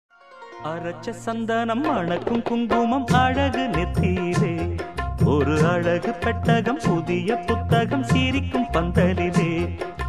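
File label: puberty song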